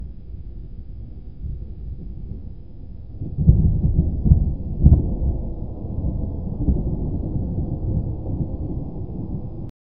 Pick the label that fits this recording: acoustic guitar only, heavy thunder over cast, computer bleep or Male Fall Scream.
heavy thunder over cast